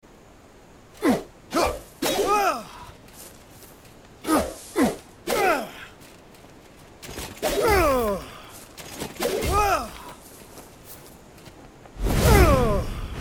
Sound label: Attack Efforts